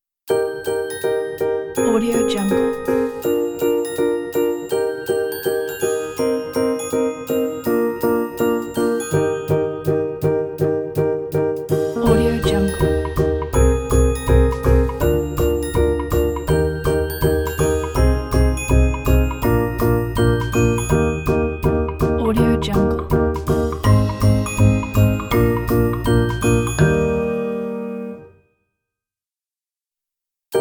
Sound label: cute and simple ident